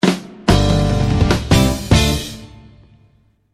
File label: Award Ceremony Stings